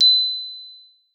B5, GLOCKENSP S; Xsample VOL-4

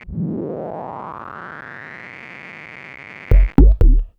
Perc FX; Sonic Foundry; Sony Creative Software; Stylus Pressure Urban Grooves on Digital Wax; Nu Tang

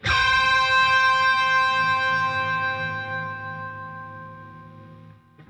Funk Guitar; 90 s Sample CDs; Zero G

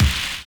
RAIN BEAT; Univers Sons - Kitch 70s

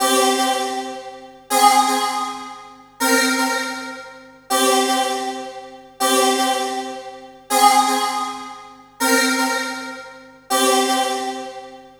Dance Synths, Hardcore Loops, Synth Loops

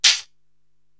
Shaker Crunchy, Drum Machines, Korg TR-Rack

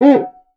QUICA, Percussion; Voice Crystal - Steve Reid Definitive Percussion

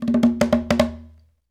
fill, Bembe fills; Bembe 6/8; Spectrasonics - Supreme Beats - World Dance

